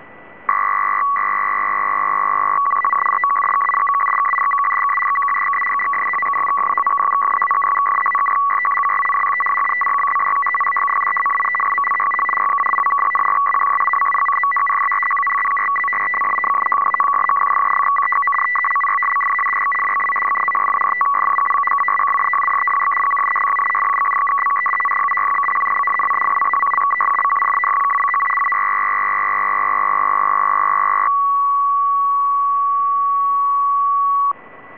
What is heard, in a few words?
RATT; NATO ENCRYPTED RATT